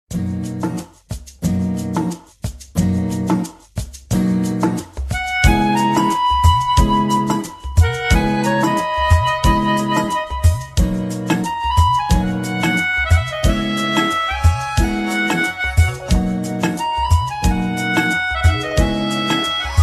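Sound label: romantic instrumental; bodyguard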